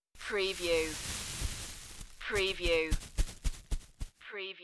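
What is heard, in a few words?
Plastic Bag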